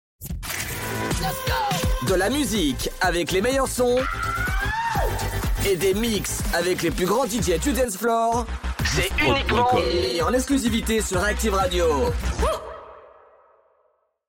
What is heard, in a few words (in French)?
Radio Jingle Musique et Mixes; Reactiv